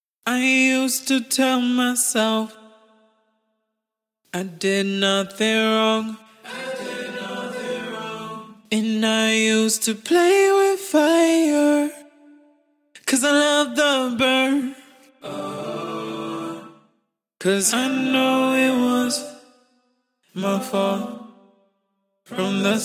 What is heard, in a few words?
trap, 110, Am, acapellas